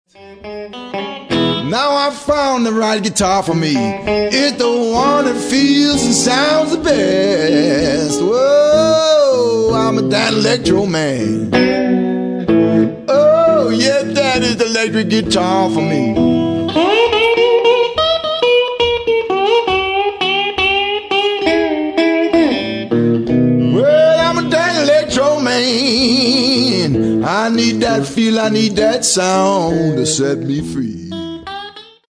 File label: electro